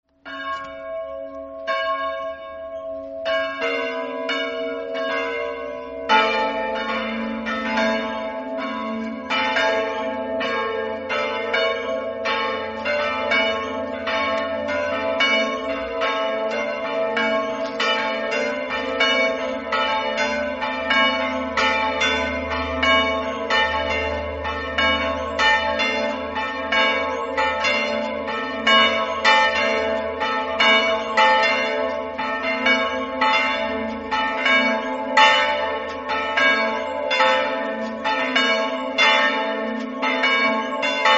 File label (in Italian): campane